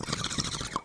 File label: bong end